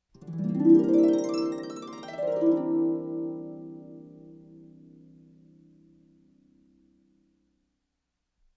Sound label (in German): Instrumente Harfe Dominantsaptakkord Auf Abwaerts